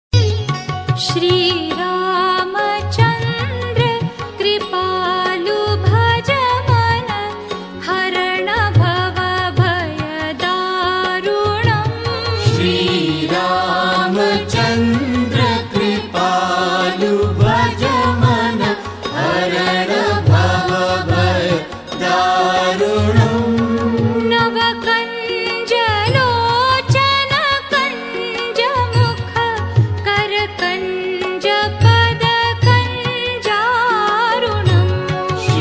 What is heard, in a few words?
Ram Bhajan